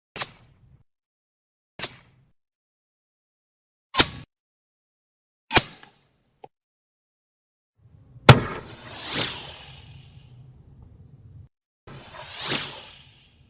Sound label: Bullet Fly By